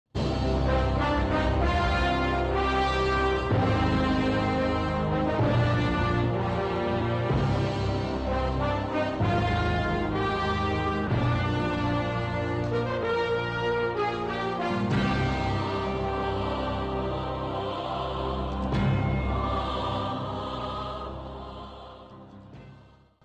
interval bgm; Khaleja

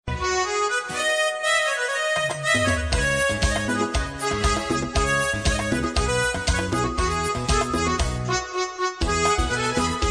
old hindi instrumental ringtone; 90s old hindi song ringtone

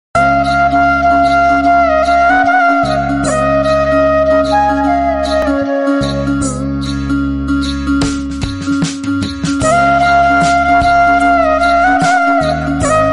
ram ram jai raja ram flute bgm bhakti

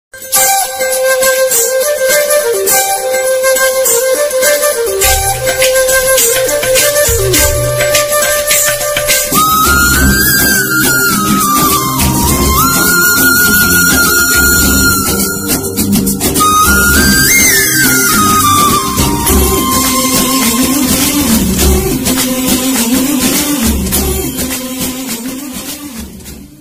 flute ringtone for mobile; rhtdm

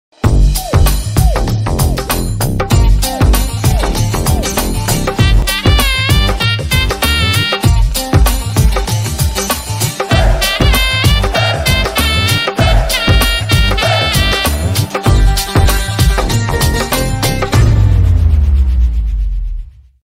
movie background music; F3; Venkatesh; Varun Tej

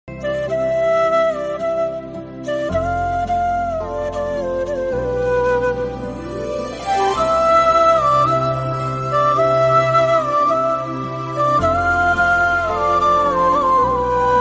instrumental ringtone

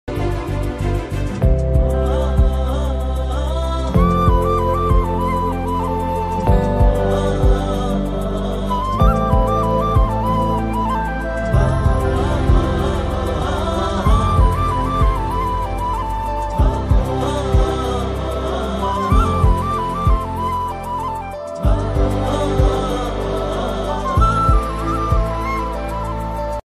climax bgm ringtone; premalu movie; long distance relationship

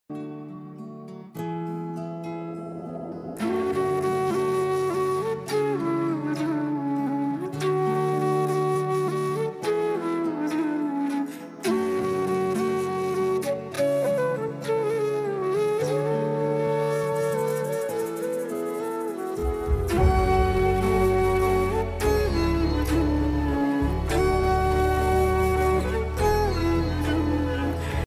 sad ringtone south indian sad bgm ringtone; naa saami ranga bgm

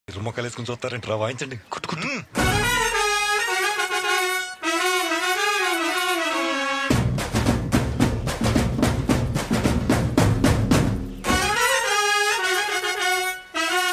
marraige band dj songs telugu band; ambajiraopet

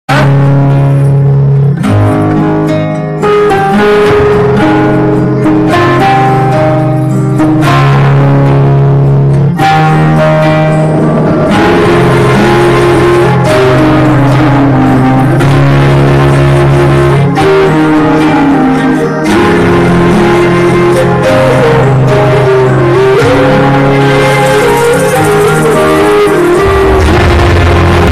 heroine entry bgm ringtone; naa saami ranga

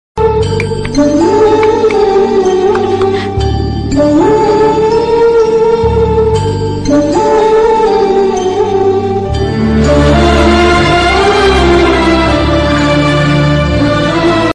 bangla ringtone

